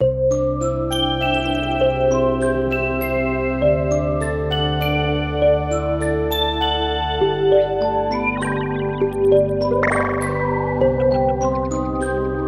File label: Snow Drops, alarms; Sharp; FX Plus